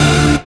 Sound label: SYN VOICE, dance synth; Sound & Vision - Gigapack I CD 2 (Roland)